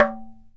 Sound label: CUICA; ILIO